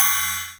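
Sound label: DRUMTRAKS crash, Drums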